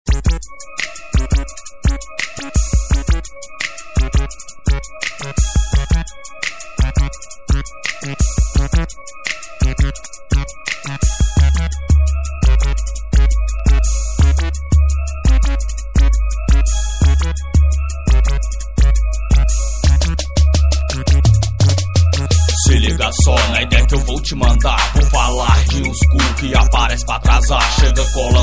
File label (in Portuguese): rap